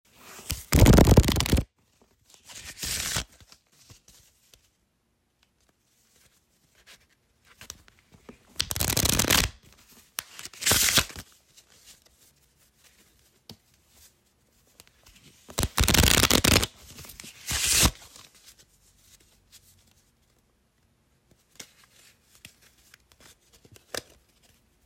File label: Shuffling Cards